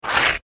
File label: paper rip; Sheet Of Paper Ripping / Tearing; foley; Objects; McKinney Sound